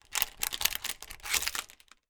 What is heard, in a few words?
door security chain unlock